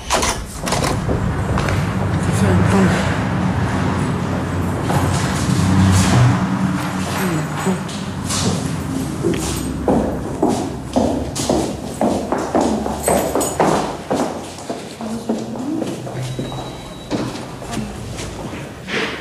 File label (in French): Bruit de fenetre; Yann Paranthoen